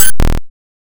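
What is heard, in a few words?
waveform FM square triangle envelope silence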